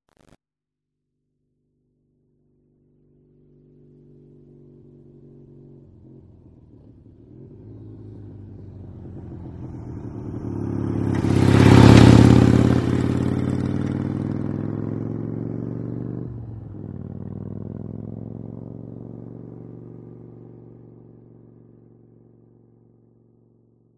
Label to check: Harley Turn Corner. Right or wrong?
Right